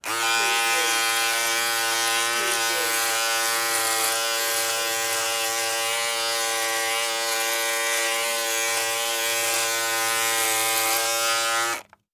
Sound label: Electric Razor; Sound Effects Bible